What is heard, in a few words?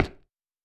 Cement